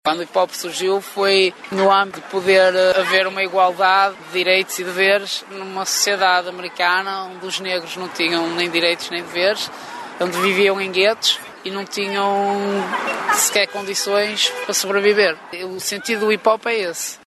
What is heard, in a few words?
hiphop